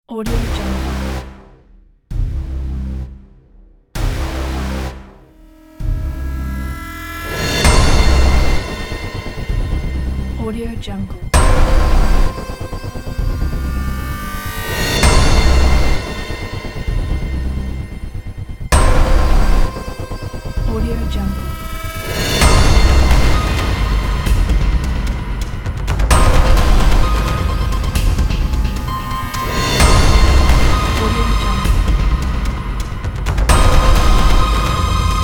aggressive trailer opener

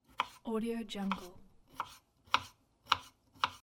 chopping vegetables

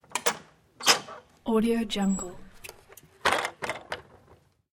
household shutters window wood open

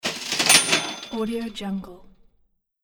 cash register

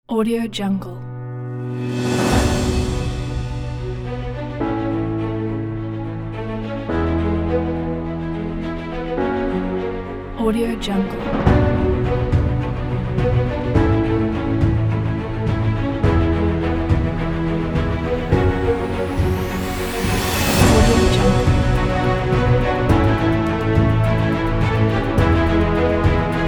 epicness inspiring

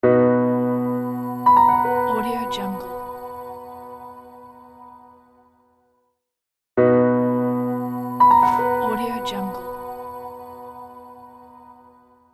short piano logo

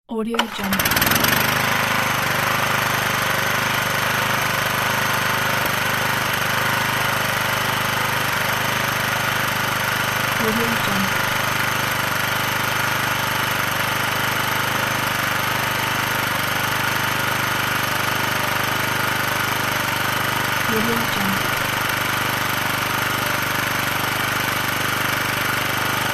tractor

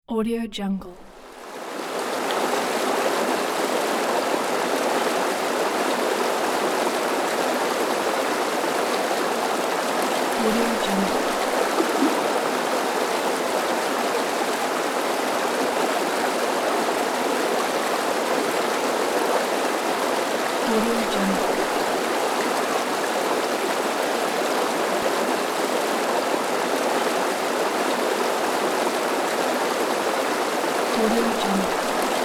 river rapids

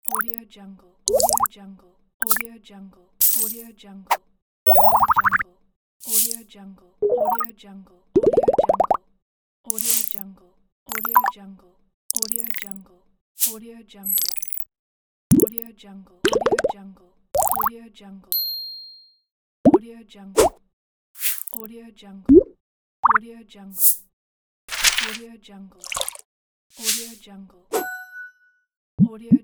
modern infographic sound effects pack

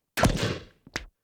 comic weapon blast liquid burst squirt drip

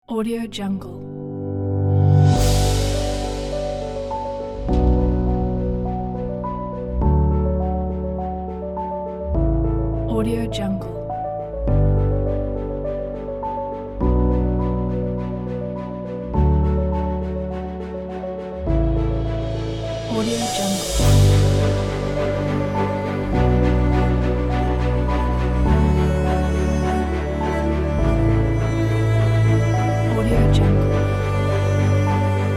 uplifting epic cinematic

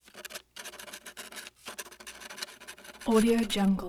scraping brisk plastic wood

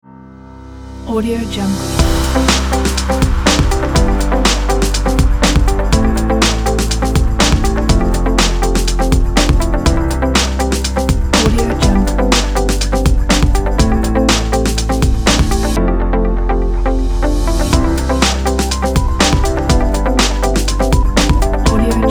innovation

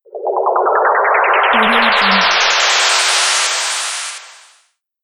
teleportation

